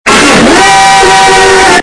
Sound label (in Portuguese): em coro